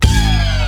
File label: Bonus Wheel Symbol; JackpotDarts; ClubFusion